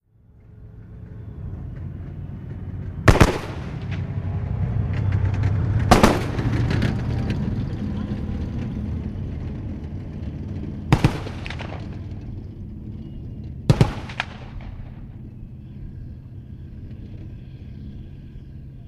Apc: Exterior Driving And Firing. 50 Caliber Machine Gun. Dual; armored personnel carrier